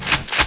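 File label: shotgun fire; quake2; railz; weapons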